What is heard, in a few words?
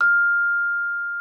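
vibraphone F6; Xsample VOL-4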